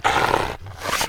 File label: wolf; kult